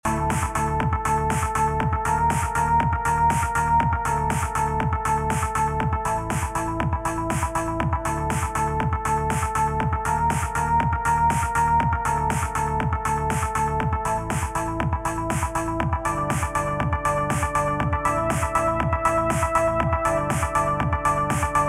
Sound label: Alien Colosseum Lo Fi